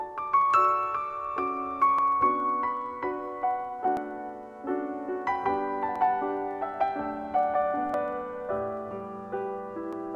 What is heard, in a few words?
Piano